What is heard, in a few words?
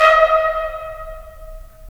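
brass trumpets staccato, right; Roland LA Composer vol. 4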